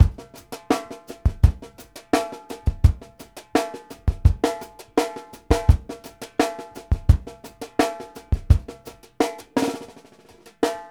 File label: 2 FEEL B L, New York City Drumworks, BR+STK 84; Sampleheads; New York City Drumworks VOL-1